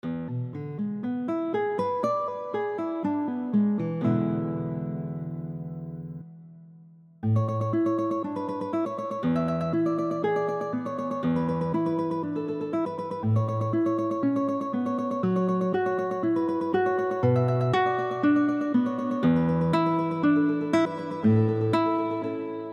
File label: pevuchee tremolo cantabile, guitar; tremolo kantabile